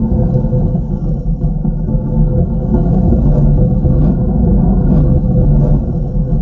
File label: barrel rolling loop; FMOD; Interactables